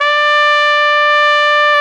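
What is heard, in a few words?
BRS AKG TP, Brass Trumpet f; Roland L-CDX-03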